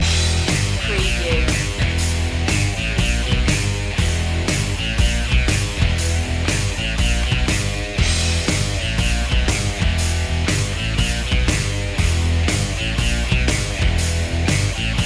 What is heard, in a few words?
monster truck loop